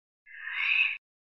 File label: Sigh; Lost Saga